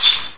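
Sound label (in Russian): цепная пила одиночный короткий звонкий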